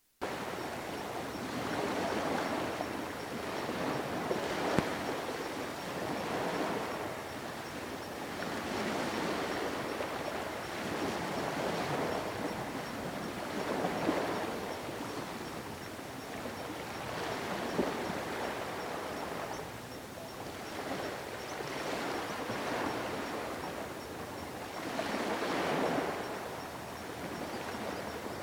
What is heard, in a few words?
Distant Surf; FXHome